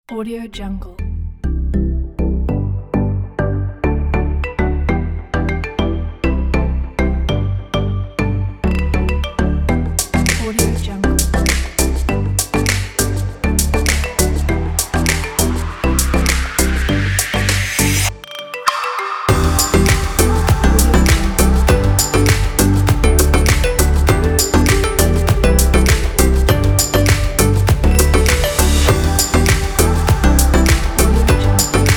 tropical

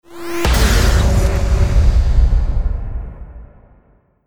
explosion impact

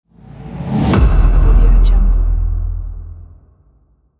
air explosion

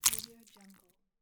fleshy bite

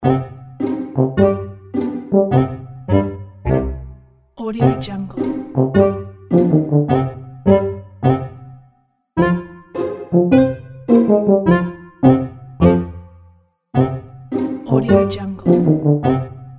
comedy cute funny music

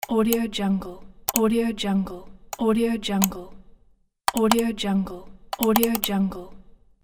mouse click

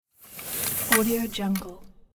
cross country ski pass by

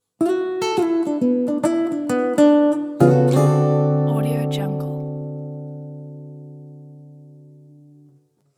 acoustic guitar transition

